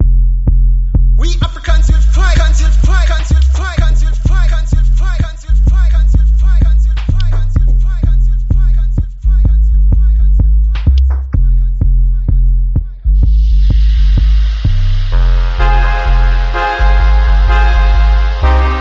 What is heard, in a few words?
Dub